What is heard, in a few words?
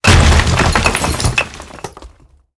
fence stone crash